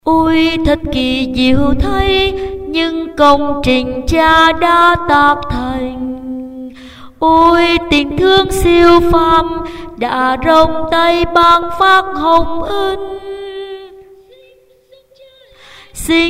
alto

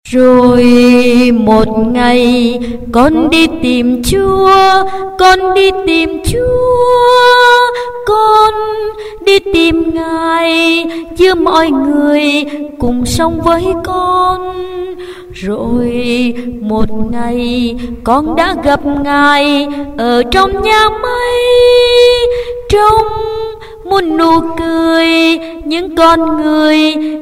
tenor basso

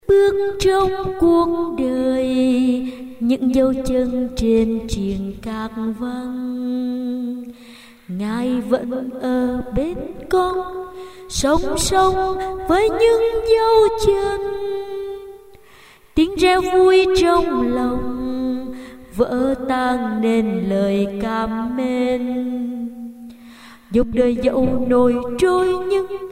sop alto

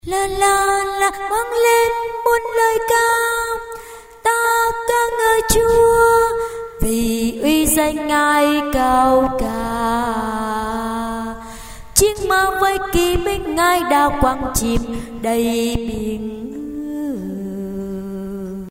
basso

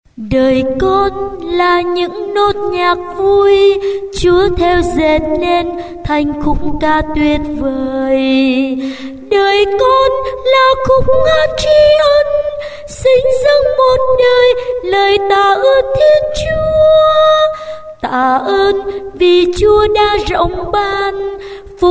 alto baso

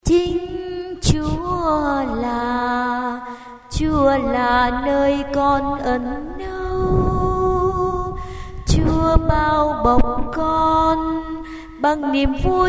basso

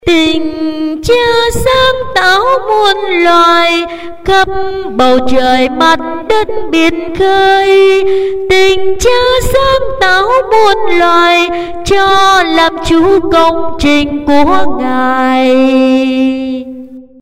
solo

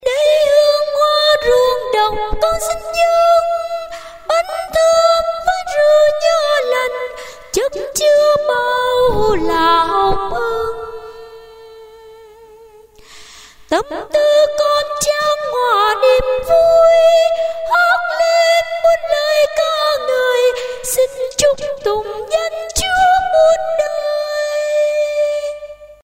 soprano

